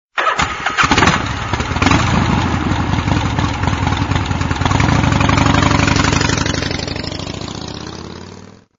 Harley; 3D Tones

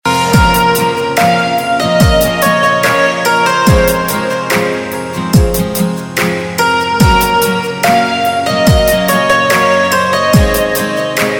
Instrumental; Bollywood Hits (2015)

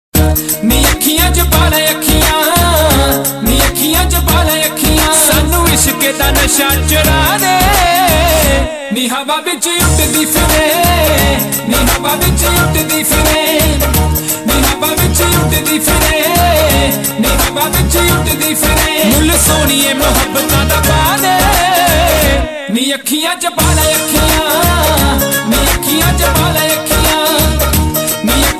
Punjabi Mast Ringtone

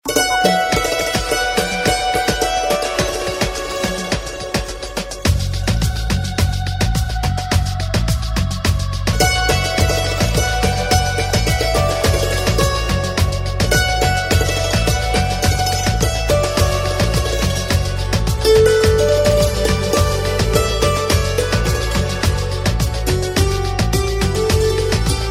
Santoor Mix Instrumental; Krrish 3; Bollywood Hits (2014)

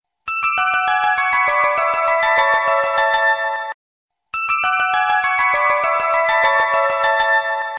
Nokia Echo Ring, Ringtones

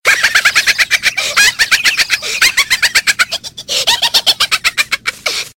Text, Ringtones; Zoozoo

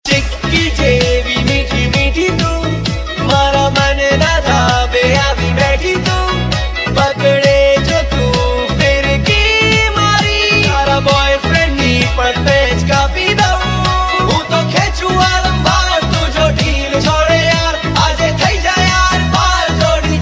Gujarati Utrayan Song; Makar Sankranti - Uttarayan

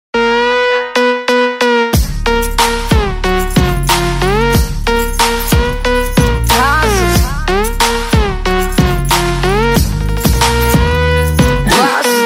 Hip Hop With Extra Bass

